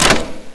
valve end; buttons

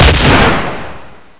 m16 fire

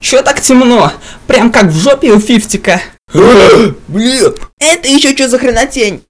dark

